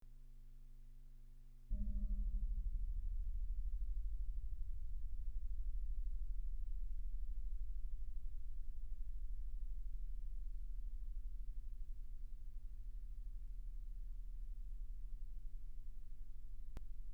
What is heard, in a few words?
beaded string